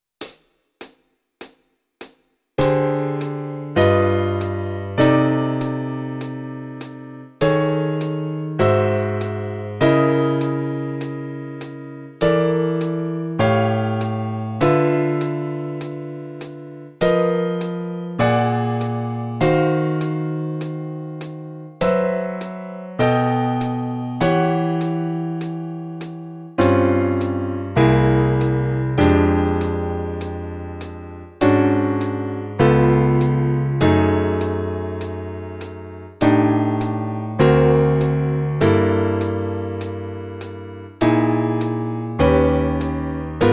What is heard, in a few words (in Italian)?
fisarmonica accordion, ii v i mano destra; minore; Pillole di jazz… I Voicings – Lezione n. 5